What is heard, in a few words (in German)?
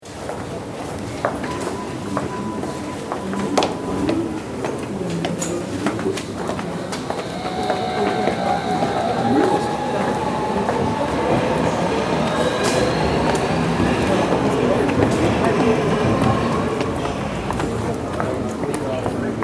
U Bahn Atmo